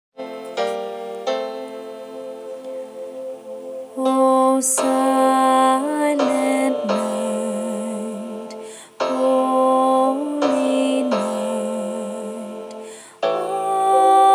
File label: silent night alto